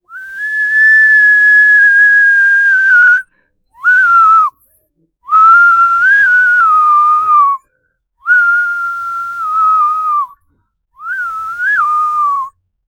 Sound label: woman whining shy and hot; expressive